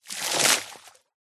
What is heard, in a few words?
nature water buckets worth throw onto surface of deep water splash